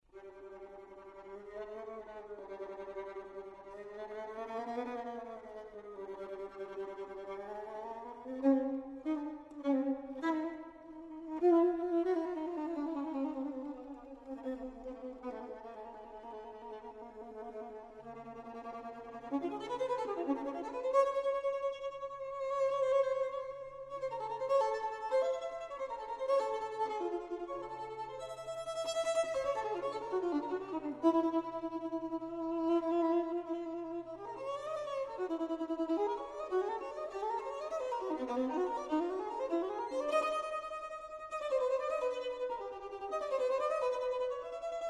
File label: Sonata for solo violin, Presto